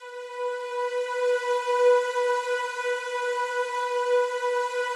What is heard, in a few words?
slow strings B3, Yamaha VP-1